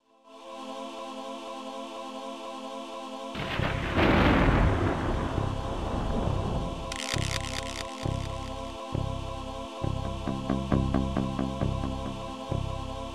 intromusic

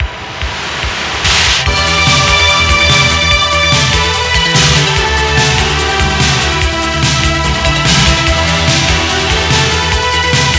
rock pop, rock band; Musical Instruments

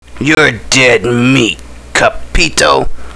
message

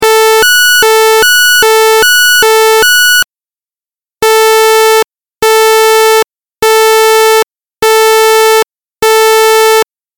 ALARM

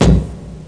SW WOOD; Thief

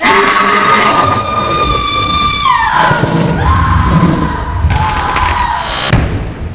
aarg; Wired for Sound Pro CD; Aristosoft, Inc.; funnysnd

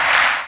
CLAP

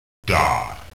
die; Easy Personal Web Page

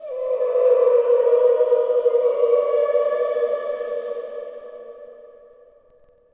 undead; DAoC

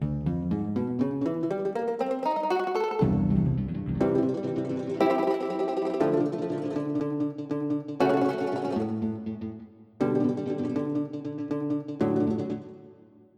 Mandolin Tremolo

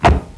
door; transpo

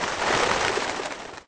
bird fly

